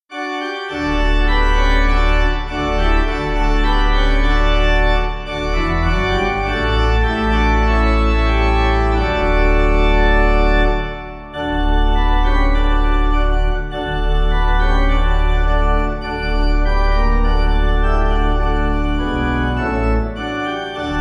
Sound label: Pipe